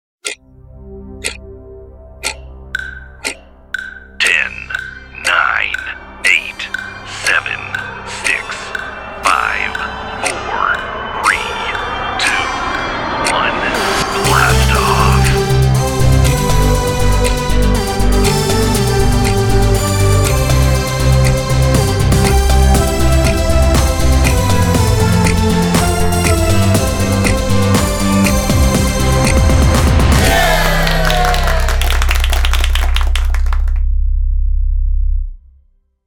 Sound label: Happy Birthday to You Clock Countdown Version